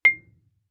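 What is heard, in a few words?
Marimba cord fortissimo C7; MIS Pitches - 2014; Percussion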